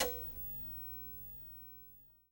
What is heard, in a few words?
screen off